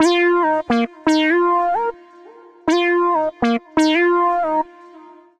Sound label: Evening Casual Bb Minor 89 bpm; MIDI Clips; Tonal; Melodic; Ableton; Factory Packs; Chop and Swing